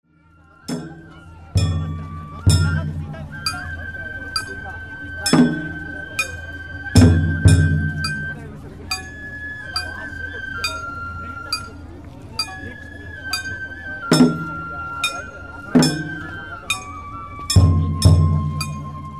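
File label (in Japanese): 本囃子, 弥生祭お囃子; 下鉢石町; 弥生祭は日光二荒山神社の例大祭。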